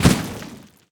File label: Earth Tank Footstep; AudioWizards